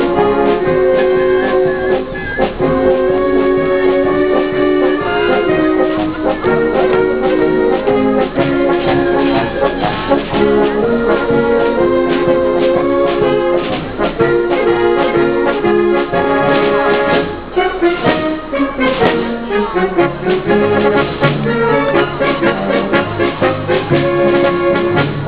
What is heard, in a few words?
ladin band; Italy; Dolomites